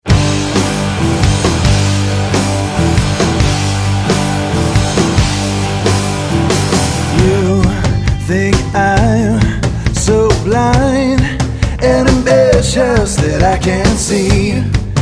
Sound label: Until You Call vocals